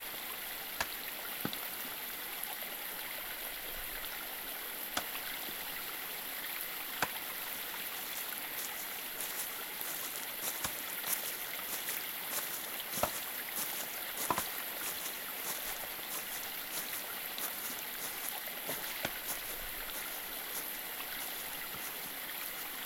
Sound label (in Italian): AMB Vertia; BLIND QUEST - The Enchanted Castle